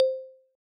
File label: ping